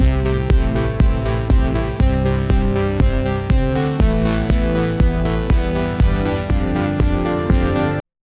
synthwave loop with a pulsating bass and dreamy pads; stable audio open small